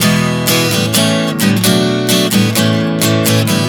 E-A-Bm7-A 130 bpm, acoustic guitar chord progressions